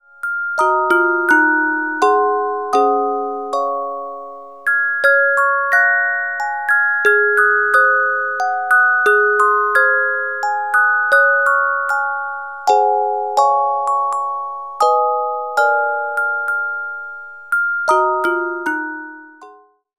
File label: Carillon Music Box Melodies